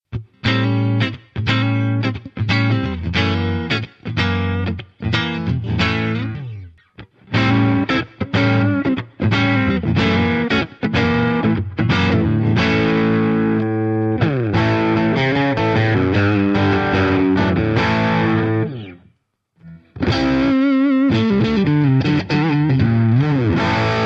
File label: SD-1 Plus dirty boost